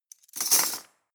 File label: cutlery teaspoons drawer place hard